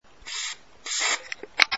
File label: Spray Can